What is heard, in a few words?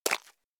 footstep slippery; fallguys